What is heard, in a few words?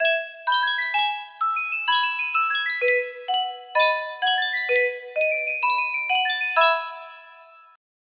miniature music box; sankyo